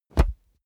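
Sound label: combat cloth bassy hit, close combat; krieg-waffen; nahkampf; soundpool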